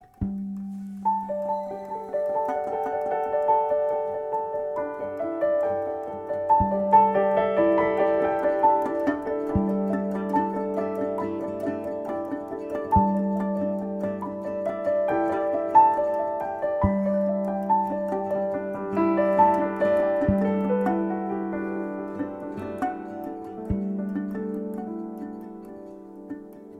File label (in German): Improvisation